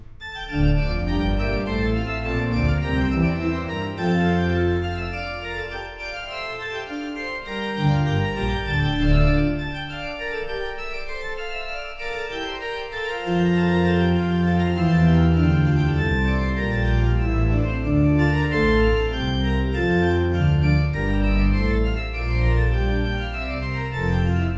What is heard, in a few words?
Pipe organ